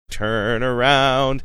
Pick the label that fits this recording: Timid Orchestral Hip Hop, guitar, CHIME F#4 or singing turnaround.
singing turnaround